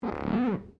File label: GLASS SQUEAK FINGER